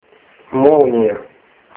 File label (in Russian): molnija